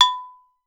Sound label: African AGOG, African percussion; Voice Crystal - Steve Reid Definitive Percussion